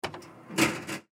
Jukebox Cd Turn Page; Booklet Page; Electronics; Technology; Noise; Blastwave

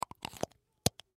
Inhaler Place Metal Canister In Holder; foley; Objects; Blastwave

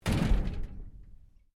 LOCKER METAL OLD DOOR SLAM; Old metal lockers

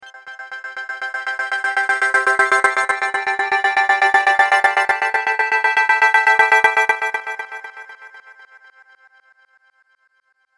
Laser Wave Pulse And Sparkle; Laser Sound

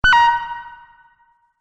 Multimedia Event Notification, beep sound effect

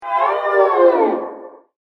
Werewolf Of Sorrow, monster; horror sound effect

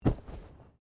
Bodymovement Fall Light

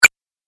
8 Bit Style Blip; Video Game Sound Effects

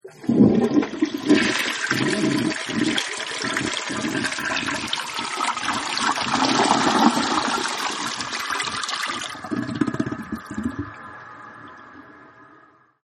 Toilet Flushes And Water Drains; Bathroom (Sound Effects:Household)

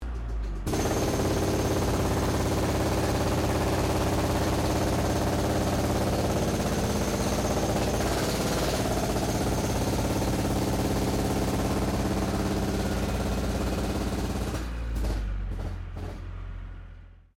Construction portable jackhammer operation long; Various construction machinery; SoundEffects Foundation2

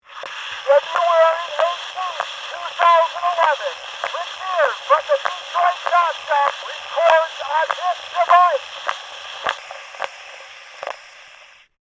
Foil Voice, Recorder; Detroit, Chop, Shop; BlastWave

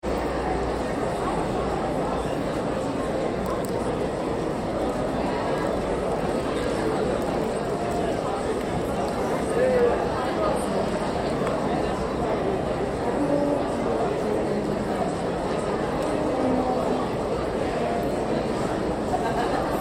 Crowd High School Grad Idle Background Band; Auditorium; Chatter; Crowds

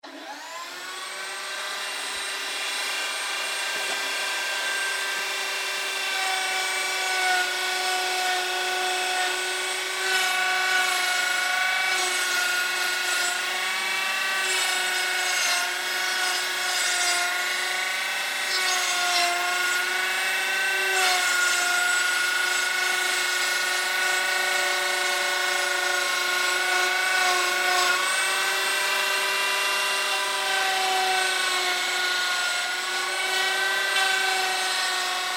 Industry Power Plainer On Run Off; Machinery (Sound Effects:Machines)

